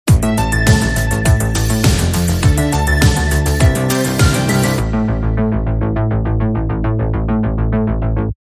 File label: Sting